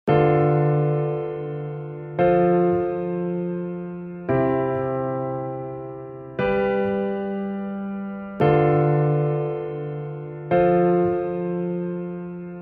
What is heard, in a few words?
SAD Type Beat Heart Broken